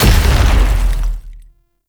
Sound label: Biped Stomp; Warframe; ManInTheWall